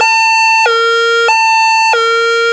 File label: horn alarm, street traffic; best service Blue Box